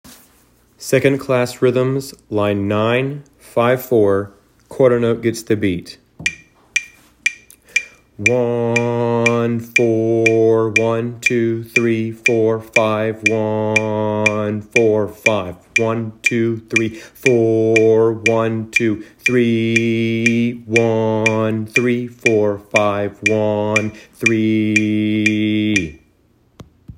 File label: rhythms